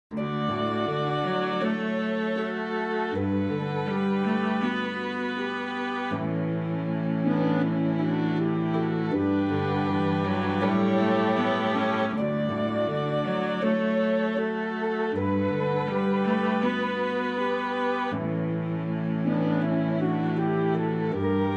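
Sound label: High accompaniment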